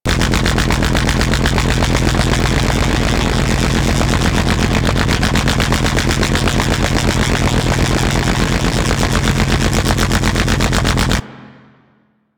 Microwave Pistol Fire Loop; Grineer

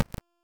Delivery Key Explode; Warframe; Nineteeen Ninety Nine; PvPvE

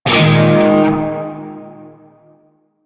Shawzin Yo Chord H; Music; Warframe; Narmer Shawzin

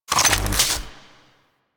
Mining Laser Draw; Weapons; Eidolon Plains; Warframe; Eidolon Mining Lasers

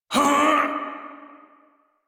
Pain Male; en; Warframe; Railjack Crew; Arbiters Hexis

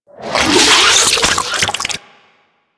Exterminate Hive Shell Grow A, Infested; Warframe; Infested Eris; Encounters

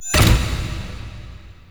Stairs; Warframe; Entrati; Vaults

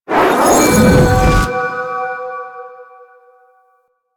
Glass Shattering Cast; DE; Warframe

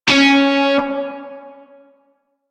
Shawzin Oct Three C Sharp; Music; Warframe; Narmer Shawzin

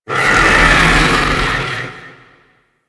Pelt Move; Warframe; Warframes; Dragon; Augments

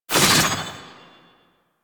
Fairy Sword Swoosh; DE; Warframe

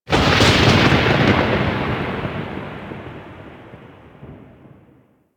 Void Storm Thunder Clap; Warframe

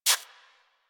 Rodent Step Dirt, Forest Rodent Foley Footsteps; Characters; Warframe

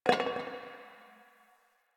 Garbage Metal Can

